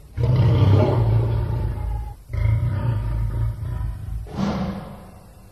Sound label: lion; Cirque